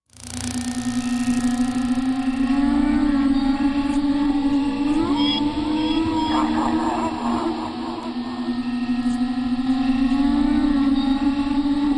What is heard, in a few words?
Eerie Haunt, sci fi ambience